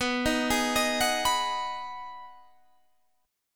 B 6th B down Guitar Drop D

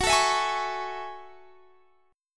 F sharp Minor 9th F sharp

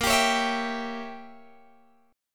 A sharp Diminished 7th, Guitar Open G; strummed

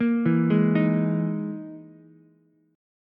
E Major 7th Flat 5th, Ukulele; EM7b5 arpeggiated; JGuitar

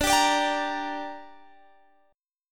D Suspended 4th Sharp 5th, Guitar, Open G

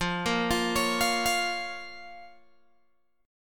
F Suspended 4th Sharp 5th F, Guitar Open G

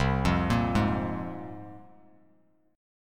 C 7th Suspended 4th Sharp 5th, Bass Standard; C7sus4#5 arpeggiated

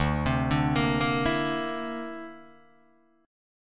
D 7th Suspended 2nd Sharp 5th D, Guitar DADGAD

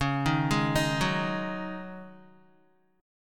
Db Suspended 2nd Suspended 4th Db Guitar; 8,6,8,8,6,x; 4F,4A,5C,5G,5C,6E; arpeggiated

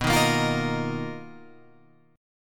B Major Sharp 11th, Guitar, Open F; BM#11; strummed; JGuitar

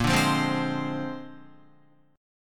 Bb 9th Bb down Guitar 4F,4A,5C,5G,5C,6E; strummed; JGuitar

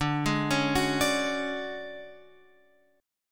D Major 7th Flat 5th D down Guitar Open F; DM7b5 arpeggiated

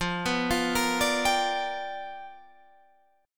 G 7th Sharp 9th F chord

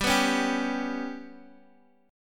G Major Flat 5th; strummed

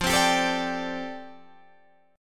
F 6th Add 9th F down Guitar Open D, chord

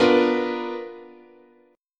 B Minor Major 7th Flat 5th, guitar chord

